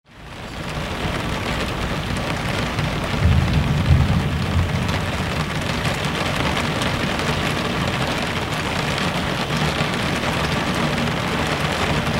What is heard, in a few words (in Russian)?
zvuk dozhdja i groma za oknom